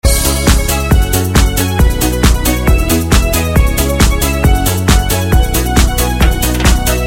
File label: Loop